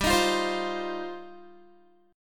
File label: G 7th Suspended 4th Sharp 5th, Guitar; Listen to G7sus4#5 strummed; G7sus4#5 Chord